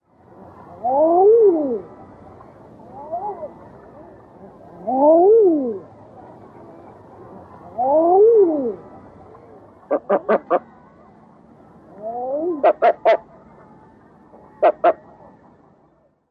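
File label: rah-ool calls grunts of male, Common Eider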